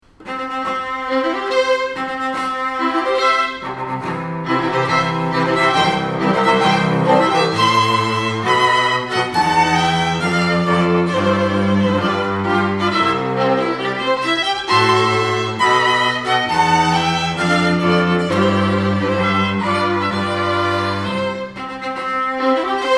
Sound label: Wedding March, strings